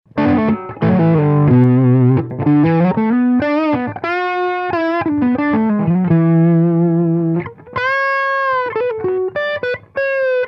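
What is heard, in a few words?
Spiritual OD Mixdown, guitar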